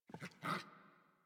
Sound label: Puppy Idle Growl Playful; Warframe; Kubrow